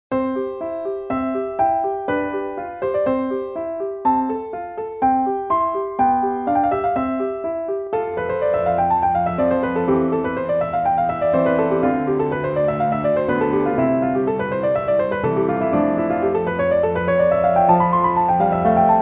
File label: Classical